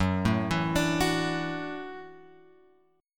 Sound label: F sharp Minor 7th Sharp 5th F sharp; F#m7#5 Chord {2 0 2 X 3 2} arpeggiated